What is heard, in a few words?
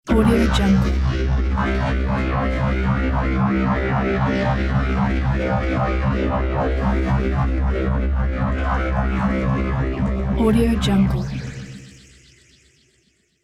ufo